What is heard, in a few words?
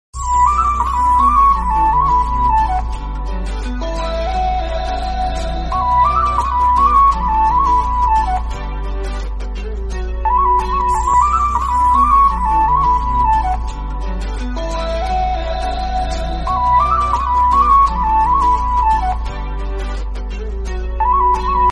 Instrumental Ringtone; Chhallang